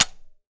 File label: dryfire; weapons; m16; Urban-Coalition; Decay; arccw ud